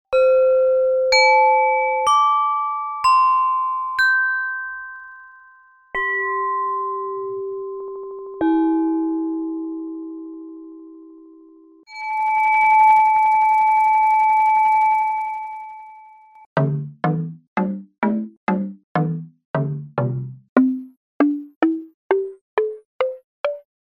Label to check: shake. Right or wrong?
Wrong